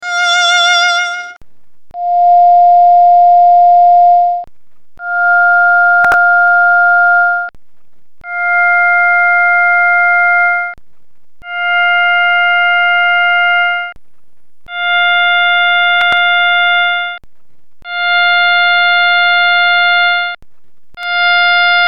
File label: violin mono med vibrato, overtoner; med vibrato til sidst; tonegeneratorer